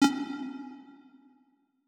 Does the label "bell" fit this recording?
Yes